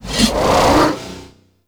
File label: Spear Gun Throw Charge; Warframe; Tenno; Hoplite